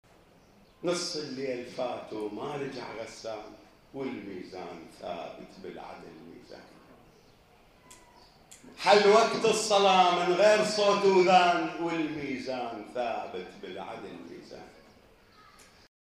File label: loop